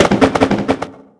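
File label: backfire exterior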